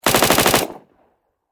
Submachine Gun Thompson 9Mm Long Burst Distant Perspective